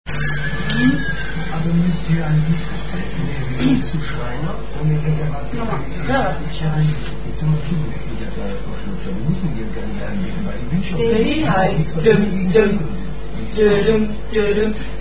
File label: German Attack Song; Weisser Hai